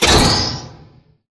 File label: generator shield recharge resume click; coop; Titanfall 2